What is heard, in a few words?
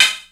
ABUSED HOUSE, CYPERPUNK; Rubber Chicken Software Sample Bank